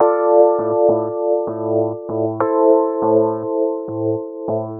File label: analog